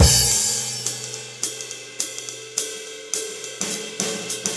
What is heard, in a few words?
NuJazz Swing Drums, Jazz; Digidesign; Pro Tools Essential Demos; Essential Loop Demos; Demo Session - Jazz